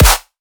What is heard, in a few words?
ass slap, snare